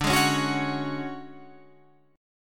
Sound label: D Major 7th Flat 5th Guitar Open F, chord; strummed; 14,15,13,13,16,13